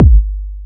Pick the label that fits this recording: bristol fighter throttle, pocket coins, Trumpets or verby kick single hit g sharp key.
verby kick single hit g sharp key